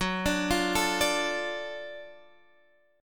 Gb Minor Major 7th Sharp 5th Gb down Guitar Standard; arpeggiated; JGuitar